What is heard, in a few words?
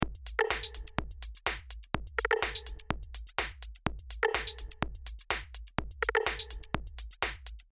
Loop with TrackSpacer inserted and controlled by Loop 1 + HPF tweaked